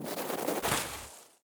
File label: centipede emerge